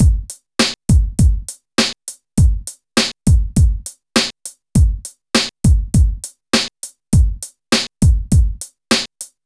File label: Drum Loop w no perc; maudio; Latin Street